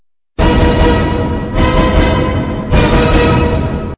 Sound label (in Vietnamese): tieng ket thuc cang thang hoanh trang